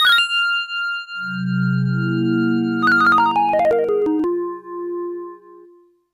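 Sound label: background music; Strider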